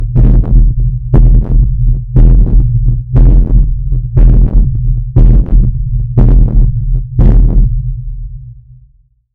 creature, sound-effects

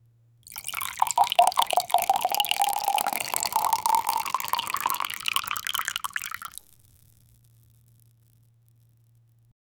liquid pouring

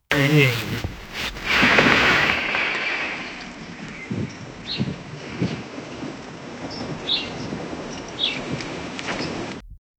wooden planks clattering

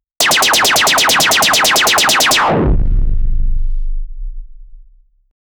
super blaster shooting

